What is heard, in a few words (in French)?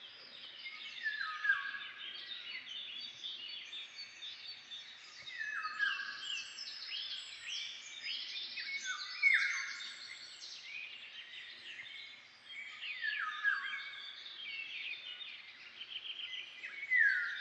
loriot d'Europe Oriolus oriolus; sorties nature 2025